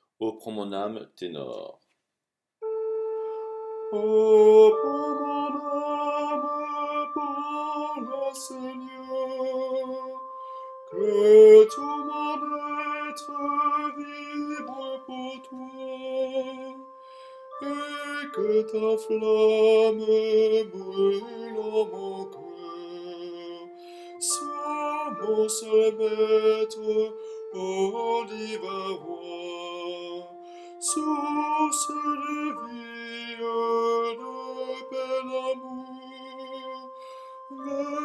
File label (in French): tenor; Oh prends mon ame